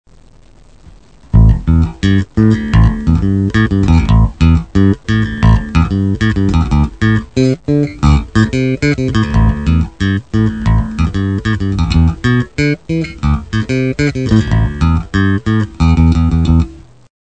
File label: Bassgroove